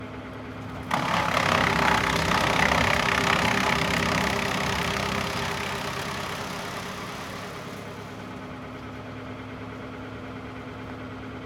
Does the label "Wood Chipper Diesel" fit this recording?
Yes